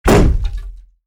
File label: Axe Impact Giant Thuddy; Глухой удар; Звуки удара; Звуки удара для Форсакен